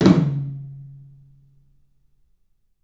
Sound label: TOM, 3D Drums, CLUB TOM; 3D Sound